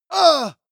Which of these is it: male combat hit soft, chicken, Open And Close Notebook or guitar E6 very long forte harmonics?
male combat hit soft